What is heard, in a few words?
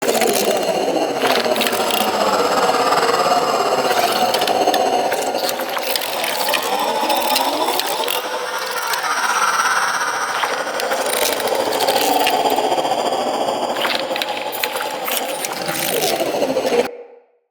Fragment Loop; Collectibles; Cephalon